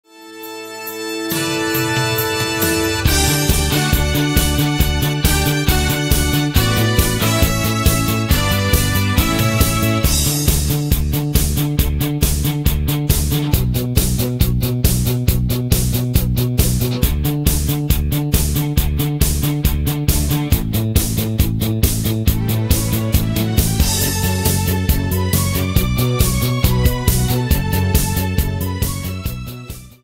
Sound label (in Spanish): Playback Instrumental